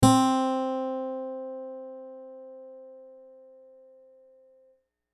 Big Steel

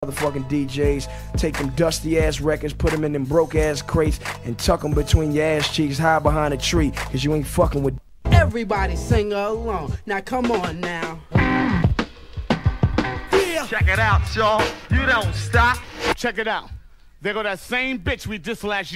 Scratch tools

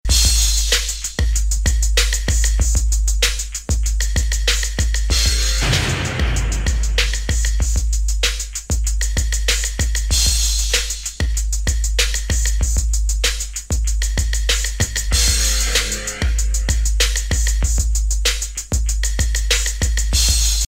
Break

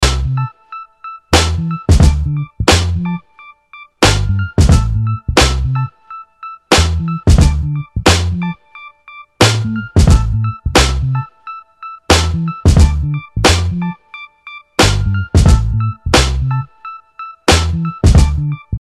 Break

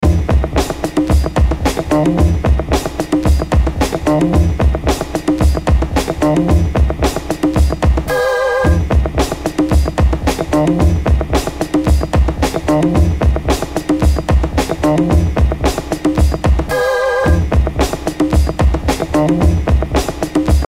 Break

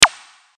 Zap

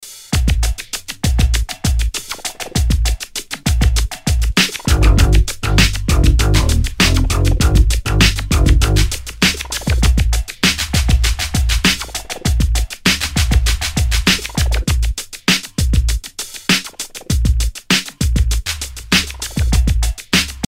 Break

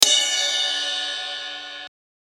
Cym

